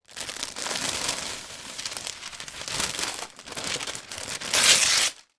household baking paper sheet remove from roll and rip; sound effects one